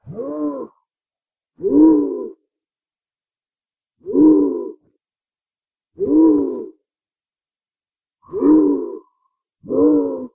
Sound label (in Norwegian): Gaupe; Rovdata